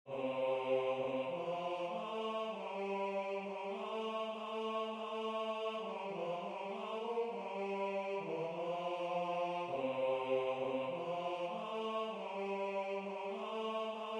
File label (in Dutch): Mannenstemmen